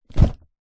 foley cardboard box parcel wrapped paper set down thud